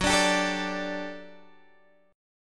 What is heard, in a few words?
G Major 7th Suspended 2nd F sharp